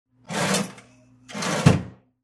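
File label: opened closed cash register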